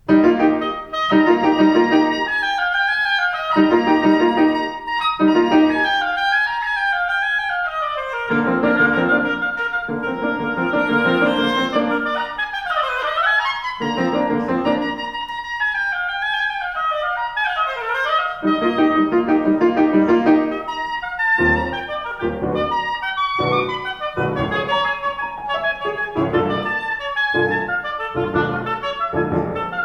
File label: Sonatina for Oboe and Piano, Vivace; Op. 28; III. Vivace; Malcolm Arnold